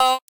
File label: vox